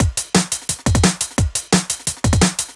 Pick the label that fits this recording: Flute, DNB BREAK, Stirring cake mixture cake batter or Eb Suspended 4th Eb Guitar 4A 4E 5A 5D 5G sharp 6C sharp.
DNB BREAK